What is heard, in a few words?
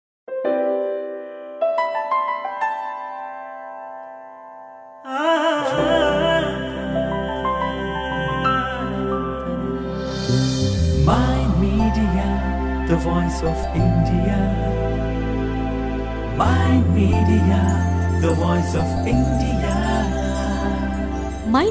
Political Songs